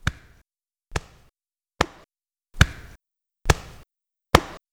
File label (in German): Schlag